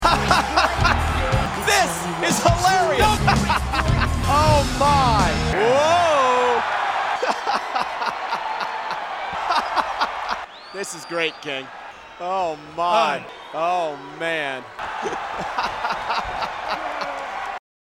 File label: fake laughter compilation; Michael Cole; d x corporation impersonation; the kind of laughter you could only muster at gunpoint